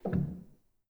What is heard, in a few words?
rel, Grand